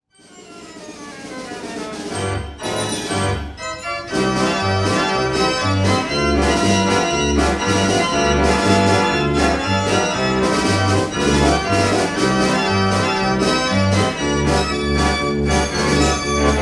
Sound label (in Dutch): draaiorgel; concertorgel a ruth no36